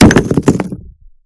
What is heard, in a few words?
subway wall hit